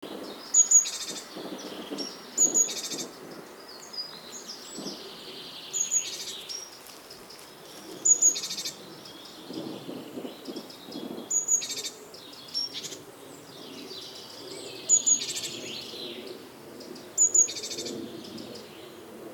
Parus major; SETE

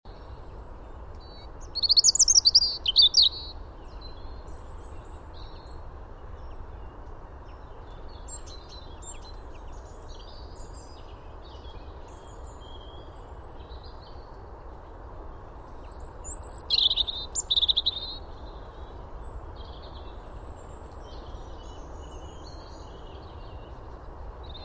Erithacus rubecula; SETE

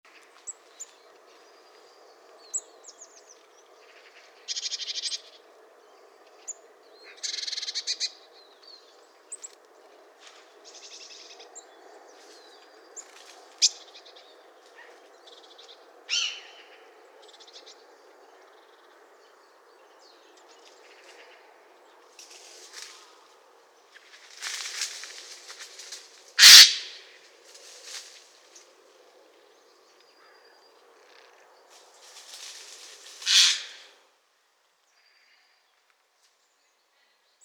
Cyanistes caeruleus; SETE

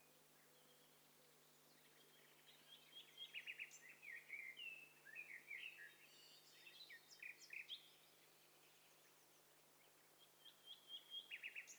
STEREO BIRD COCKTAIL PARTY

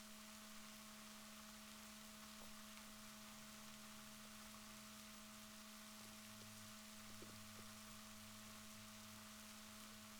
rorquals negatif, Balaenoptera Physalus Chile

